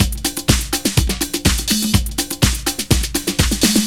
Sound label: LOOP 124